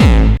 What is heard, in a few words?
Bassdrums Hard Kicks; Vengeance Samples